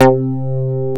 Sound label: Synths Note C